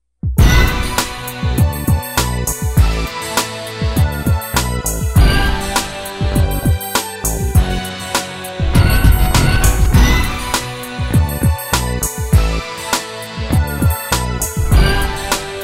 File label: pop; relationship; inspiring; uplifting; empowering